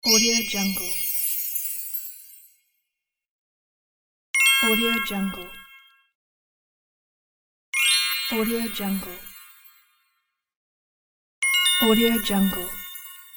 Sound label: bright shimmer win